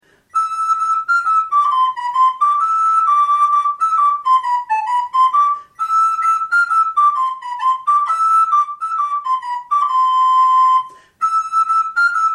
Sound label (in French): danse papillons, flute